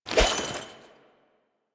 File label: Fishing Spear Bait Throw; Warframe; Weapons; Eidolon Fishing Spears